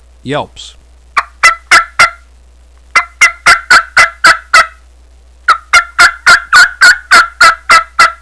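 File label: kee kee yelps, mouth calls; Kirby Klassic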